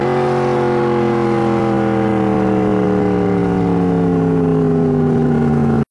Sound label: engine reverse